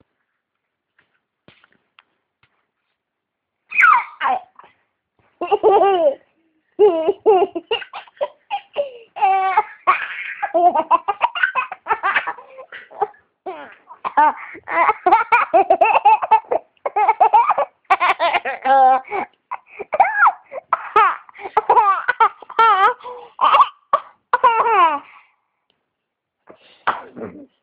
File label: laugh